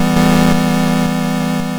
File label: YM Chord Bm; ChipShop Deluxe; ChipShop Hits